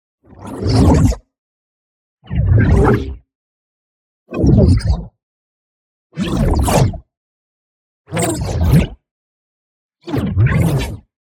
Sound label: ABILITY CHARGE Energetic Gestures; boom library; Matter